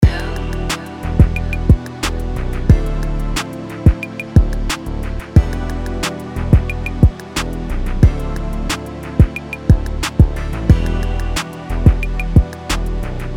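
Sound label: loop, drum kit; all aboard kit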